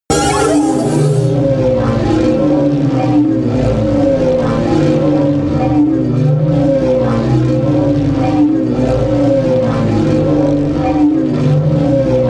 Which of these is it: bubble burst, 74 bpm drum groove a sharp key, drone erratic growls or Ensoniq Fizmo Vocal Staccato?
drone erratic growls